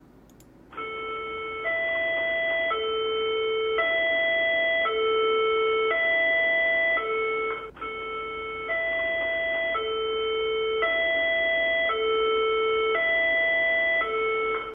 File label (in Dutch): Kazernealarm